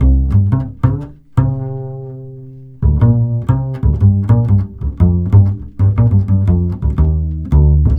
Cool Upright Bass

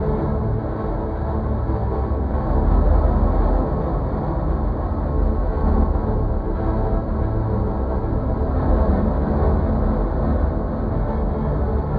80 Dm Ambience; Ambient Synth Loops; Aerosol

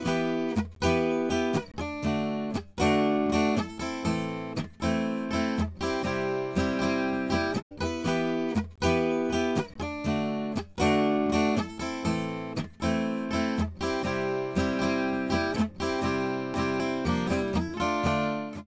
Acoustic Gtr

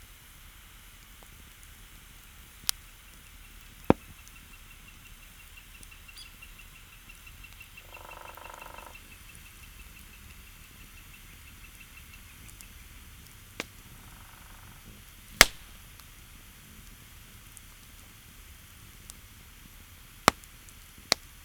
jungle quiet

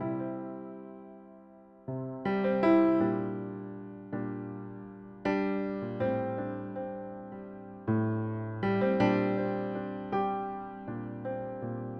Delicate Piano

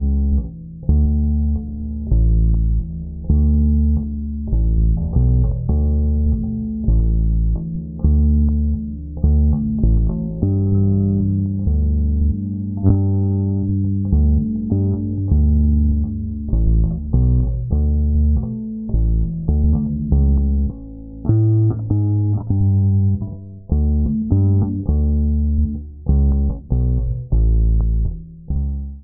Bass

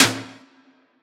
Snare; Loop Set - Futurism - Synthwave Loops; Drum Samples

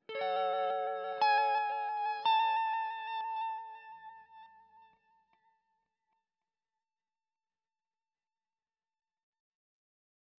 Elec Gtr

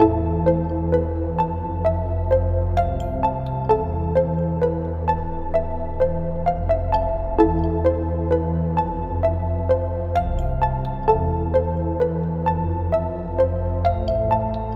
Dark Melody stabs Em 130

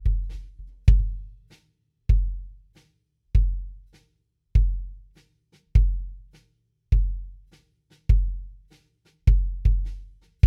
Kick

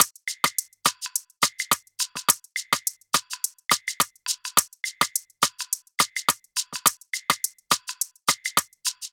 percussion afro

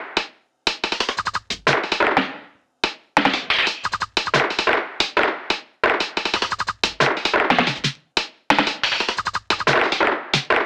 90 Percussion; Downtempo; Drums- Downtempo Loops & Samples; Sanctum

